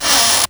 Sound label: Sweeps Short